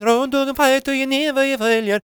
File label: GAELIC, World Voices; ILIO; Vocal Planet